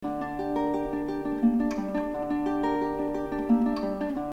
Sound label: Second Chord; Prelude In D Minor